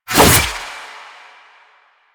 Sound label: Sickle Whoosh; Warframe; Lasrian; Lasrian Soldiers; Weapons; Lasrian Butcher Sickle